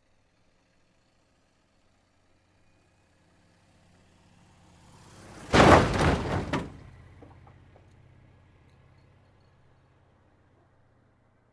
Approaching Car Crash

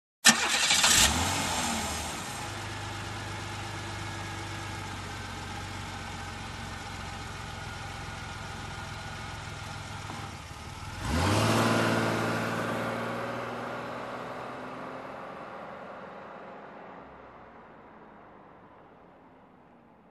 Car engine ignition and drive away

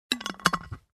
Jar lid closing

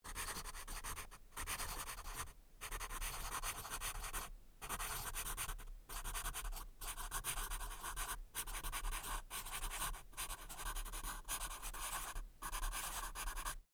Taking notes with a pencil

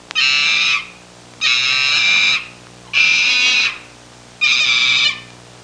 Gibbon screaming sound

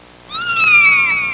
Kitten echoing meow

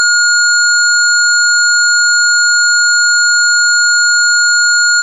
Beep High Long

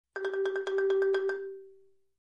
Marimba

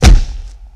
Heavy Kick on mitts

